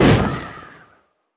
旋风人风爆声

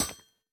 铜格栅踩踏声

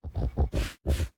搜寻声; 嗅探兽

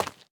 凝灰岩踩踏声

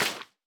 湿草地 踩踏声

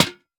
灯笼破坏声

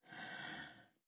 海龟待机声

